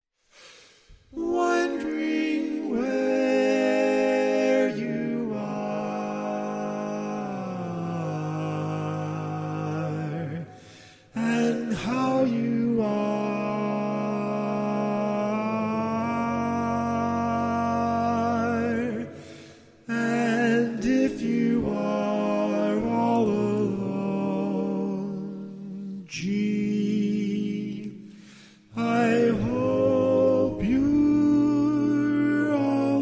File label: Lead, barbershop